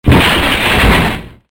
Slide

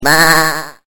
sheep

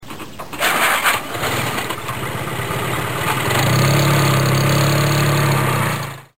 trucks

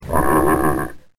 lamb

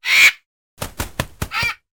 hit buz

